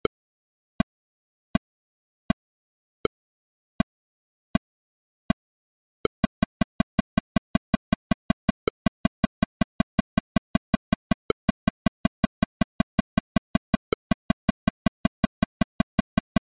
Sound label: Click follow meter ON adjusted